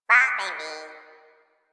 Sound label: flying robot voice